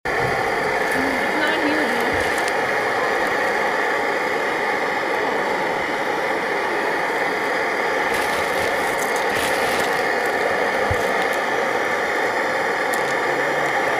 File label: Gas Stove